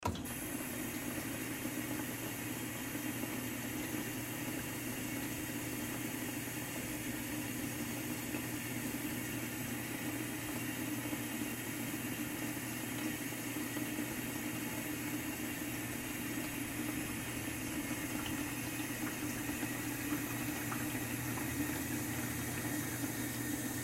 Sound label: Dishwasher, Dishwasher Ambience Run; Whirlpool Appliance; Household; Blastwave